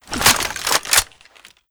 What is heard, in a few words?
ar2 reload; weapons; npc